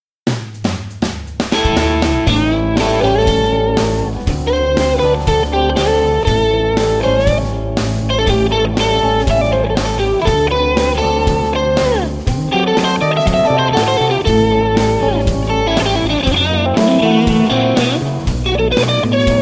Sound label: Mesa Mark V Blues